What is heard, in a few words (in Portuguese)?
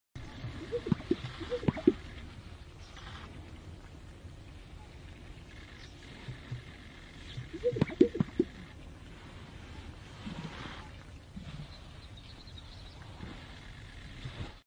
canto do tetraz cauda de faisao